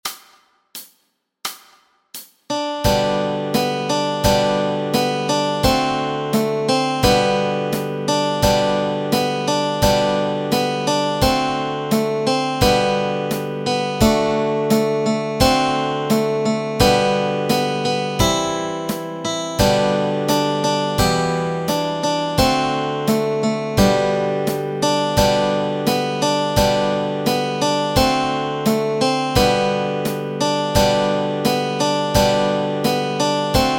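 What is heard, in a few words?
melody and chords, Easy Guitar and Ukulele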